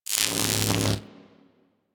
Infested Arc Rifle Fire Random G; Warframe; Weapons

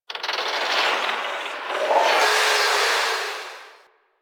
Landscape Construction Site One Off, Ambience; Warframe; Venus Open World; One Offs

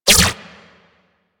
God Ray Beam Activate; Warframe; DE; Sentients; Sentient Trooper

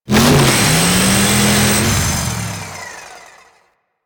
Saw Melee Swing Heavy; Weapons; Warframe; Grn Ghoul Saw Player

